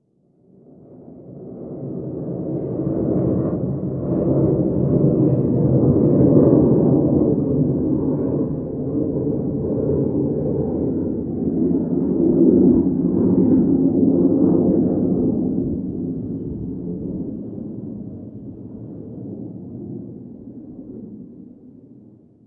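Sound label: jet flyover interior; Call of Duty Modern Warfare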